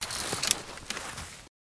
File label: Chain